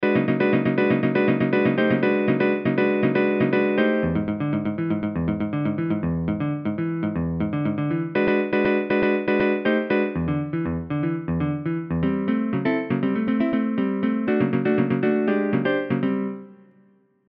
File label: Funk, Funk Licks; Bluffers Guide to Funk